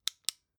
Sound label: flashlight off